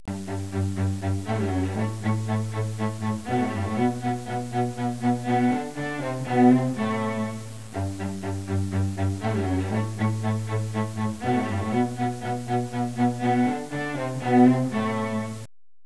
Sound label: Strings